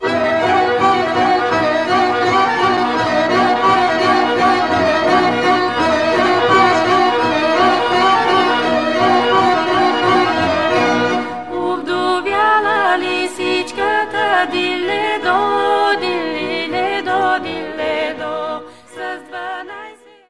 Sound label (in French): Zensko Kapansko, Bulgarie